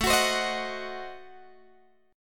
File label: A Diminished 7th A down Guitar Drop D